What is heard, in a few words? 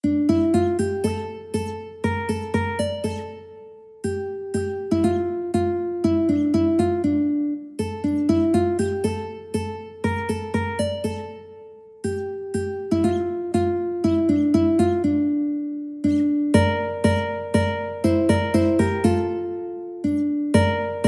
ukulele